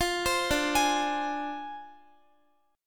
D-Minor 7th Flat 5th, D, Guitar, 4E, 4A, 5D, 5G, 5C, 6F; arpeggiated; x,x,15,17,14,15